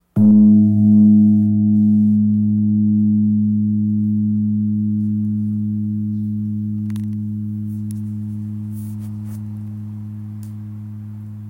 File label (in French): Gong birman Sol dièse 8.4kg